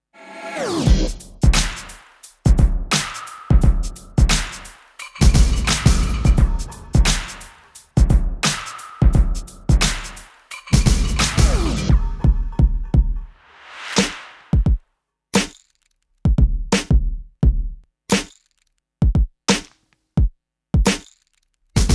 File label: Percussion